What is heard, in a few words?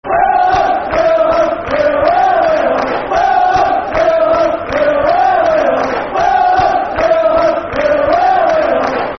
We Are The Hearts Football Chant (Soccer Song); Heart Of Midlothian, Hearts Hfc